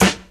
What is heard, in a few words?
clean snare drum g key; snare drum samples